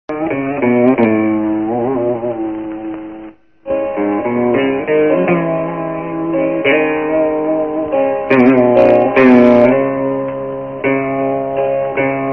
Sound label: veena palukadha sad, telugu